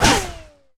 bullet, ricochet; Weapons

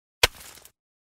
hoe

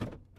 shift, speedo

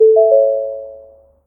ford, belt

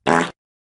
new engine, Vehicles

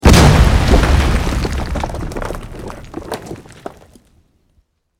tnt

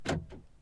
unlock, Vehicles

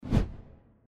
cam move menu

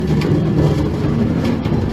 rail cart roll

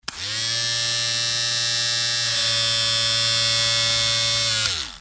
farm razor

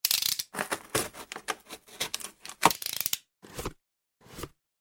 cut

